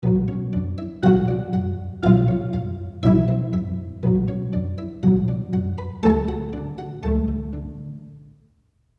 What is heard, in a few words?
Aperture, pizz; Hall of the Mountain King; Spitfire Aperture